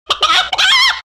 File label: Chicken; rooster